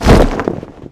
flag hit

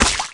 Bullet Water, Impacts

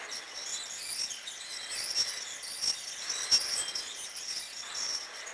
meat Hook rail; machines mechanical

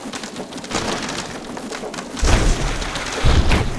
ship dismast; Sid Meiers Pirates; XBOX

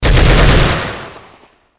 ak burst; Soldier Of Fortune II Double Helix; XBOX

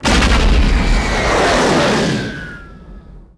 explo starfire whistle; impacts explos

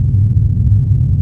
cairn core, ambience

